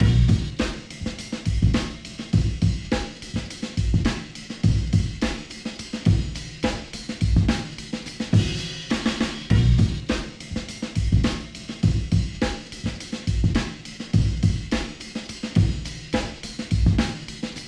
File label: Jukebox loop; ASF M2